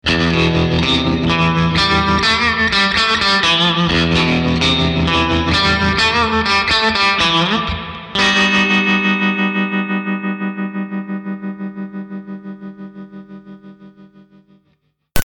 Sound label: TREMSTER